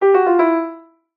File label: multimedia notification tone piano soft alert; zapsplat